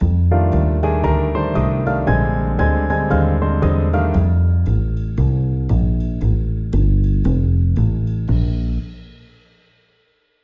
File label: Big Piano Chords